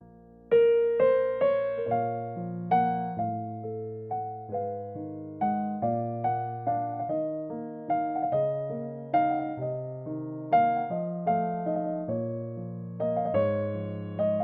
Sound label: Instrumental Piano Version